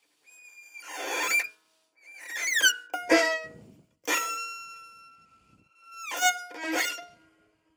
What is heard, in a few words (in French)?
écrasé; Baschet; Groupe Geste; Geste Recherche; Extrait Conférence